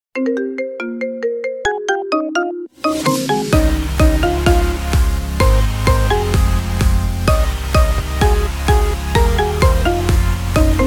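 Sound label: Iphone XS, ringtone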